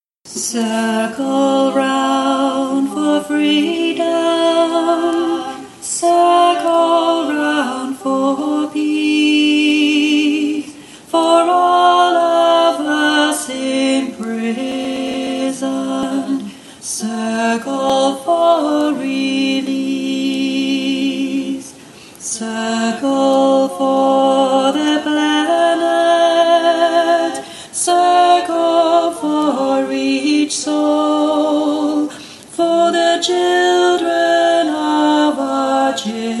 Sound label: Circle Chant alto